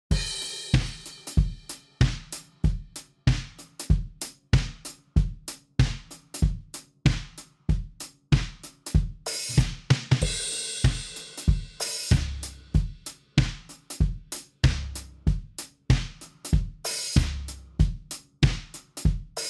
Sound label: drum rec sample